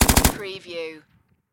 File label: Machine Gun Russian PKM Short Burst Close Perspective